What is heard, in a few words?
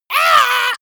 monster painful cry high